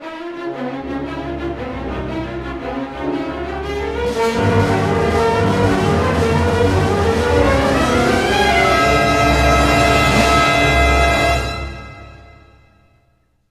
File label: GRO, Orchestral Loops; 128 HITCHCOCK